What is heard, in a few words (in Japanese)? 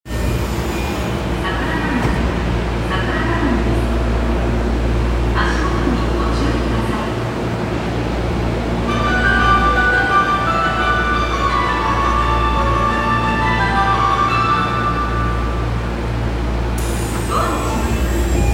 有楽町線桜田門東, train sounds